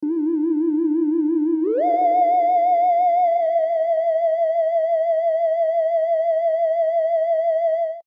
Sci-Fi Theremin; iMovie Sound Effects

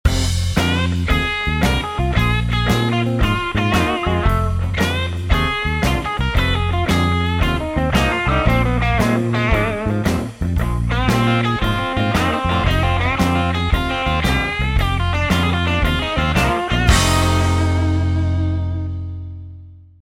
Windy City, jingles; iLife Sound Effects

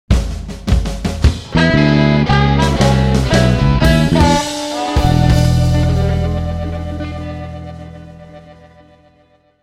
Barbeque Blues, Jingles; iLife Sound Effects

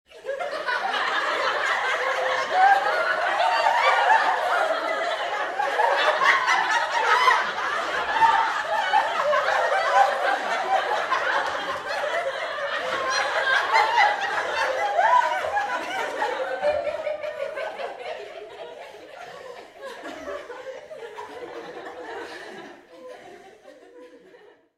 Sitcom Laughter; People; iLife Sound Effects

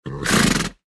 Horse Snort; iMovie Sound Effects

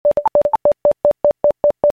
Communication Engaged, Machines; iLife Sound Effects

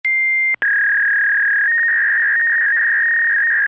Fax Machine Tones; Work - Home; iLife Sound Effects

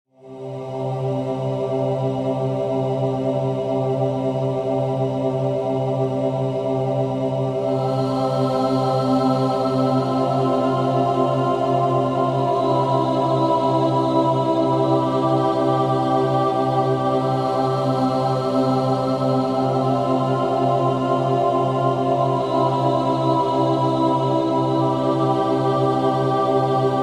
Synthetic Design; iLife Sound Effects

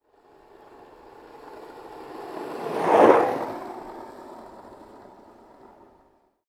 Skateboard; iMovie Sound Effects

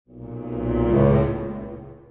Suspense Accents, Textures; iLife Sound Effects

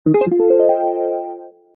Soft Pulsing Accent, Stingers; iLife Sound Effects

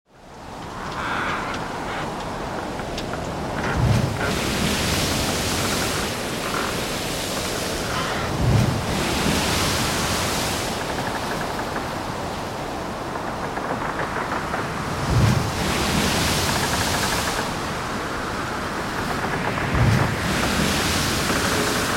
Ship in Heavy Sea; Transportation; iLife Sound Effects

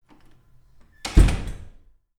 Door close; iMovie Sound Effects

